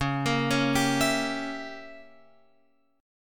C sharp Diminished 7th C sharp down Guitar Open F; C#dim7 arpeggiated; JGuitar

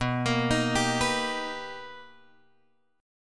B Augmented Major 7th B, chord; B+M7 Chord {7 X 8 8 8 7} arpeggiated

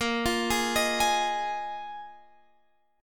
A sharp 7th Suspended 4th down Guitar Standard; arpeggiated; capo=9

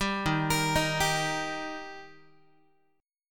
Eb Major Eb Guitar; arpeggiated; JGuitar

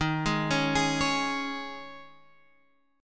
Eb 7th Suspended 4th, Guitar, Half Step Down; Eb7sus4

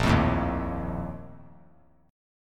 Bb Major 7th Suspended 4th, chord; strummed; JGuitar

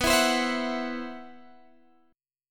C-Major Sharp 11th B, guitar

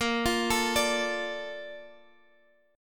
A sharp Major 7th, Guitar, Half Step Down

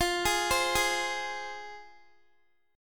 F Minor Guitar, chord; arpeggiated

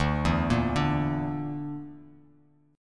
D Suspended 2nd Sharp 5th, Bass Standard, chord; Dsus2#5; arpeggiated; JGuitar

